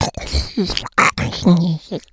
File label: become alert, infected; npc, alert, becomealert